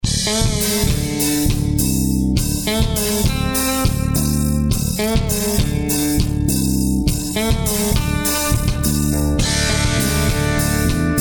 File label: rock